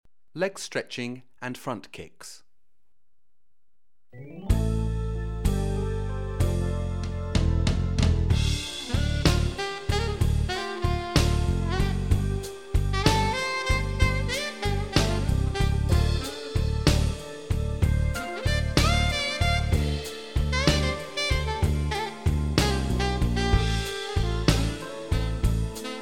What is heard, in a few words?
Leg Stretching & Front Kicks